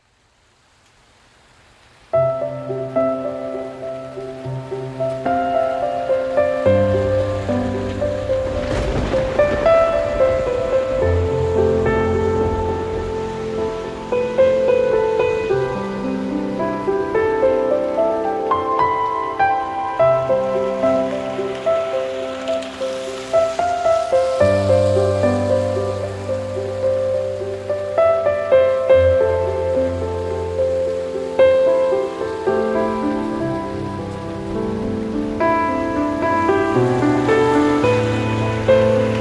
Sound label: Piano and Rain